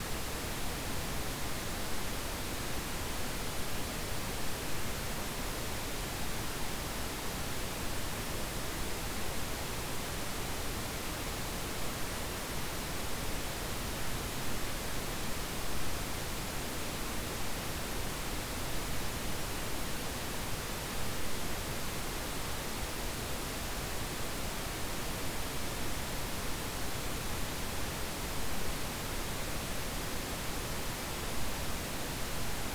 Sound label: pink noise; ambisonic